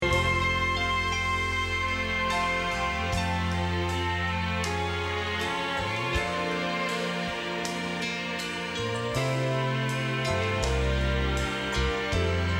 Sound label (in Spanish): Bajo